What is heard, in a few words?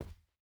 footstep burlap cloth jump land; Rust